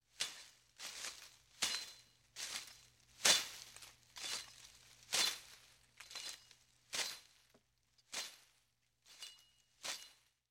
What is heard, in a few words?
Cutting Through Brush; Blade Swishes Cutting Through Light Brush With Rustling. - Cutting Bushes; hits & impacts